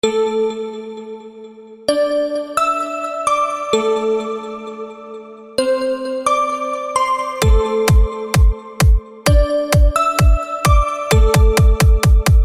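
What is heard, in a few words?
Bell Remixed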